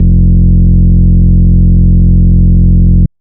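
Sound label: bass sustain brute F; Polyend; ARC Noise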